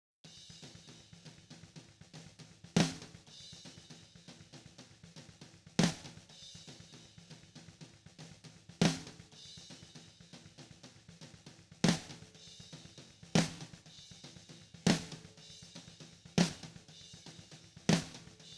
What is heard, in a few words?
snare